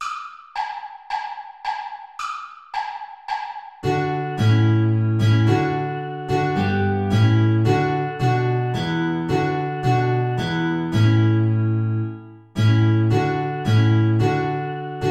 Klarinette in Bb Gitarre hoch; Play-along; Lobt Gott, ihr Christen, alle gleich

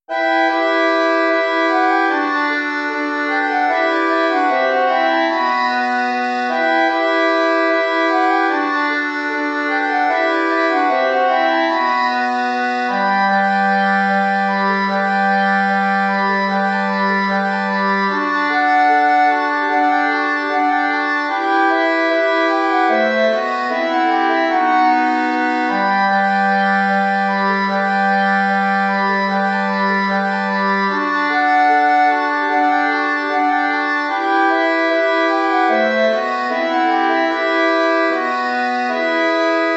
Bourree II (4 Klarinetten in Bb)

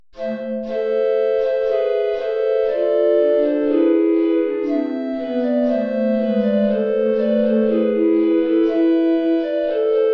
3 Blockflöten; You Lovers that have Loves Astray

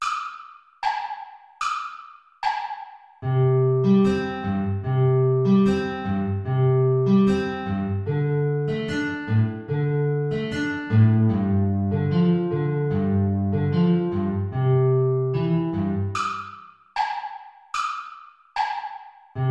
Klarinette in Bb Gitarre hoch Play-along

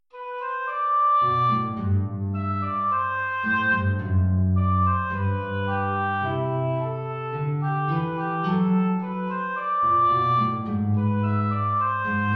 Andantino, Oboe & Gitarre

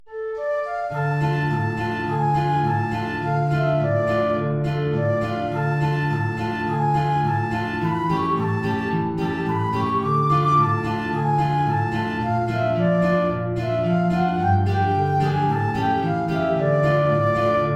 Querflöte & Gitarre

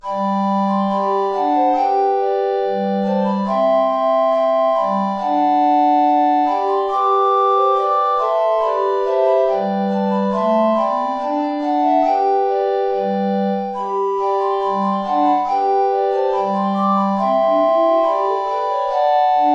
Ballet (4 Blockflöten) (SATB) (hohe Notierung)

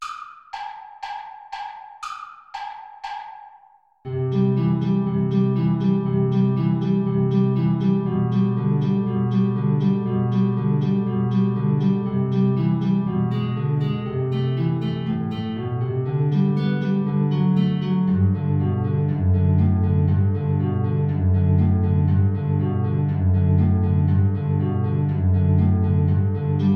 Maestoso Altflöte Gitarre Play-along; tiefe Notierung

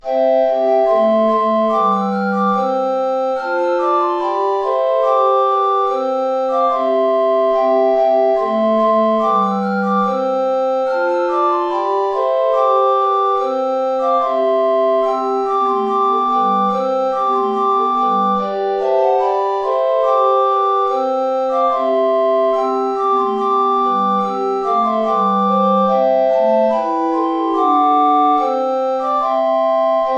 Bransle (4 Blockflöten) (SATB); tiefe Notierung; Bransle de la Royne

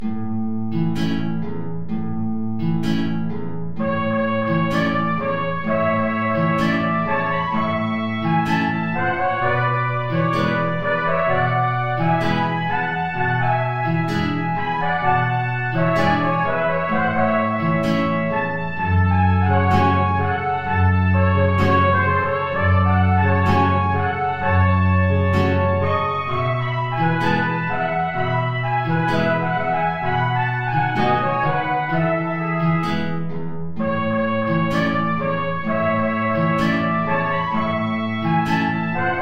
Tango, 2 Trompeten in C & Gitarre, hoch

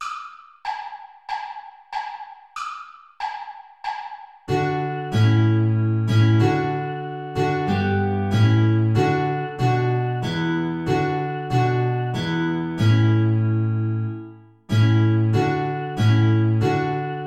2 Gitarren Play-along; Lobt Gott, ihr Christen, alle gleich

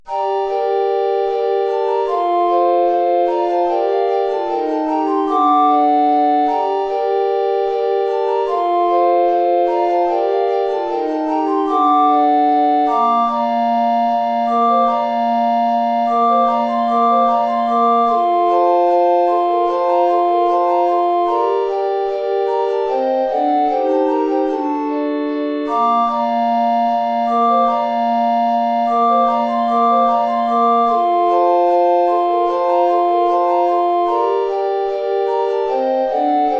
Bourree 4 Blockflöten SATB tiefe Notierung

